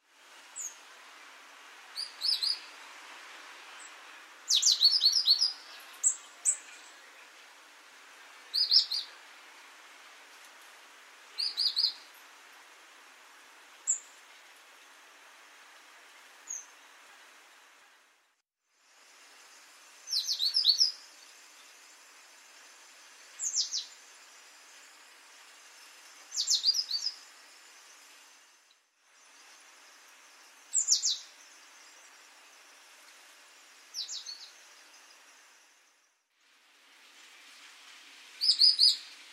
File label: Parus major; SETE